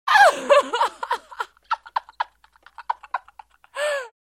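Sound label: sounds of female laughter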